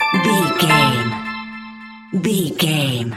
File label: point sticker bonus score reward jingle